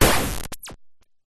Laser-Like Synth Laser Noise Blast Oneshot; sound spark

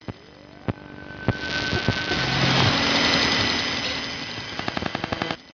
TRANSMISSION RADIO GLITCH LOOP; PMSFX

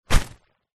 household umbrella close grab hand

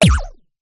science fiction thud impact hit generic; zapsplat

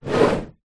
plastic tub slide on top of another; foley

